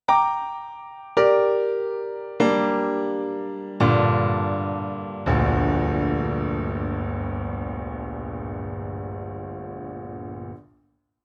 Bösendorfer; Alpha SW

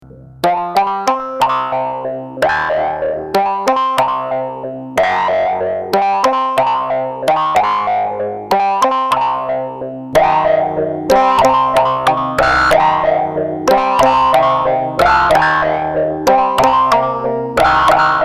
MS20mini

